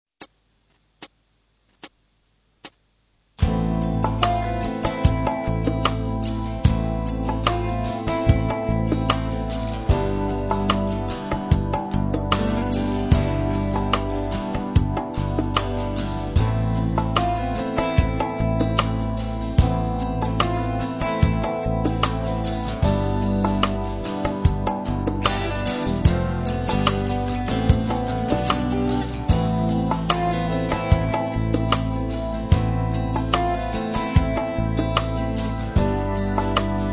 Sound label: rhythm; steinberg Xmas